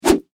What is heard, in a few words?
Foley Whip Whoosh Heavy; Whooshes (Sound Effects:Sound Design)